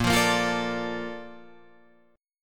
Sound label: Bb Major 7th, Guitar, Drop D